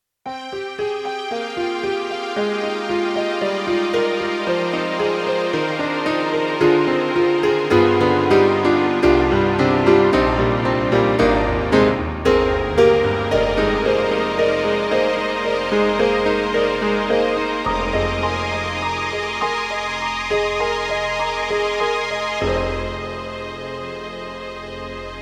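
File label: YAMAHA MDP-5 XG Piano Strings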